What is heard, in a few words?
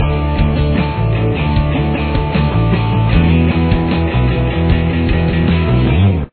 pre-chorus, guitar